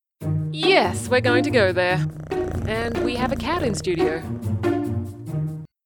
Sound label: Podcast Intro Music Purr